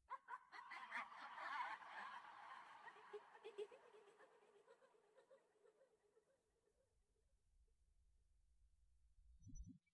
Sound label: horror laugh; 3D Pinball Space Cadet